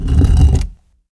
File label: touchplate stone push